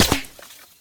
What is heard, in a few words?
stone, hit; tacz-tweak-pack; taczmechanics